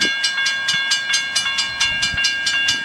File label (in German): US Glocke